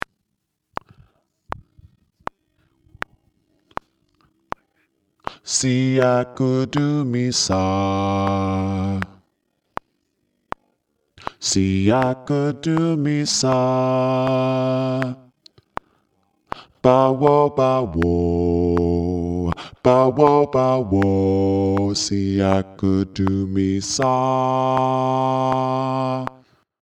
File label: Bass Solo; Amen Siakudumisa